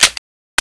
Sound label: claymore place